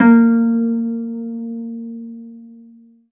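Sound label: HARP C5 SUS, Strings; ILIO; Synclavier Strings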